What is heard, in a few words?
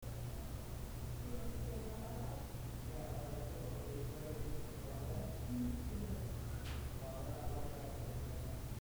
Boiler